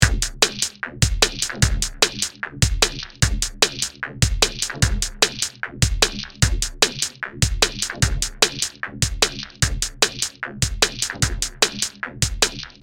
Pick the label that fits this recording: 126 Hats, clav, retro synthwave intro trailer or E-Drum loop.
E-Drum loop